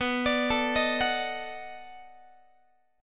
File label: B 7th Sharp 9th, down, Guitar; B7#9; arpeggiated; x,19,17,19,19,17